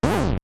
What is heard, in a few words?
Cane; Using the Cane of Somaria or Byrna; LTTP